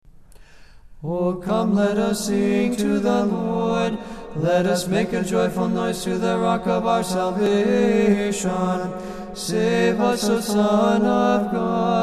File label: daily antiphon